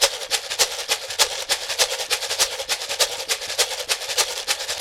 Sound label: TAP, African; African Contemporary; Spectrasonics; Supreme Beats